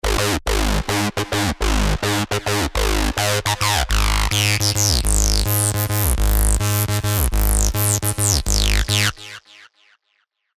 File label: Acid Loop G# (105 BPM) Hypnotic; Spire; ACIDVSLASERS1